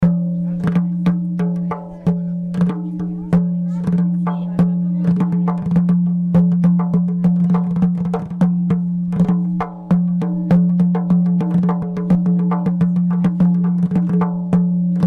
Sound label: mini daf